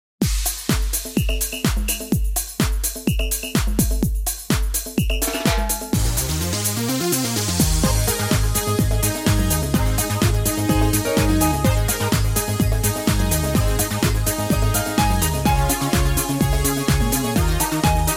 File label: harmony sample